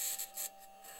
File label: mic, mars2020 edlcam